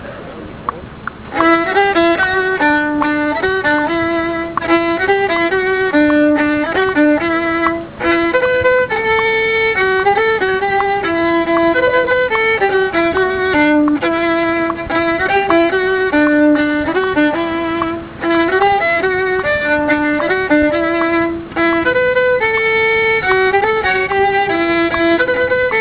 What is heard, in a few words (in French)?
violon